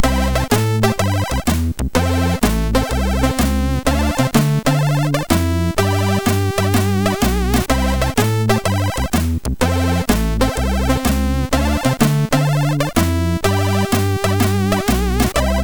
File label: Jazz, MOS6581